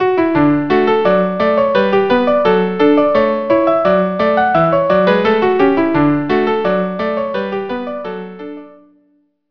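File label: violin with viola; sheffield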